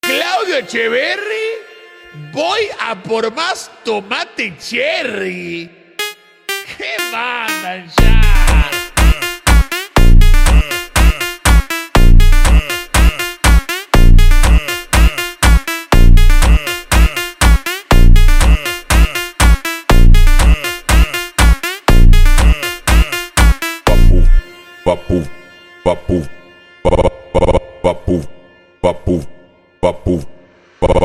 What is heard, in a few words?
FUNK BRAZILIAN - SLOWED